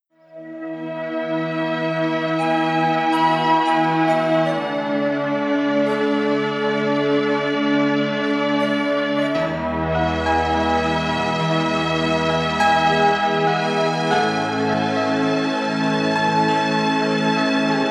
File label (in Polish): muzyczny miks relaksacyjny 432 Hz; radosne slonce